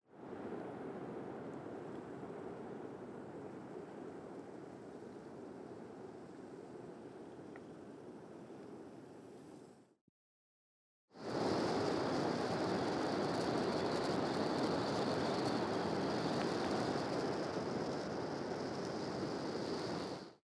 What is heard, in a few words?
medium and high levels of shipping noise that the pilot whales experience